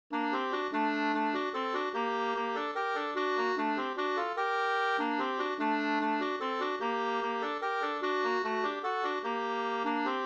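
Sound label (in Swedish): vals Gmoll, two-part; version transponerad från D-moll till G-moll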